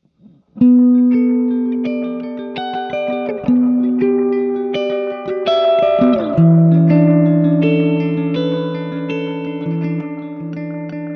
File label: Tele AC Chime Solo